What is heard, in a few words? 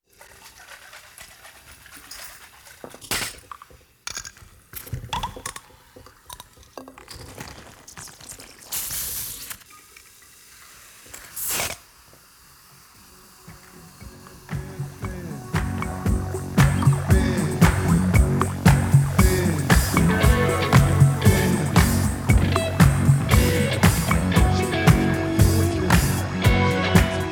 Disco Version